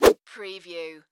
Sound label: Whip Whoosh Heavy; Sound Effects Bible